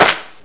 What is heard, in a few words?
SAND; 10,054 sample rate